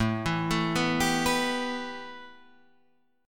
A 7th Suspended 2nd, A, down

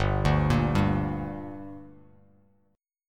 G Minor G, Bass Standard; arpeggiated; 3,5,8,0; JGuitar

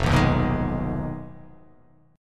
F Minor 7th Flat 5th F down

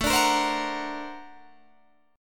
Bb Augmented Major 9th A

